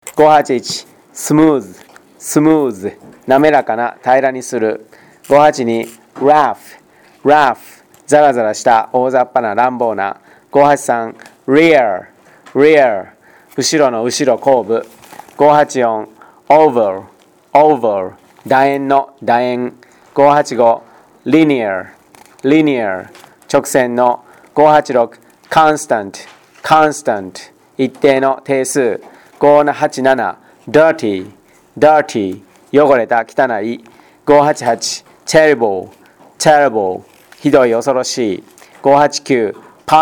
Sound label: tango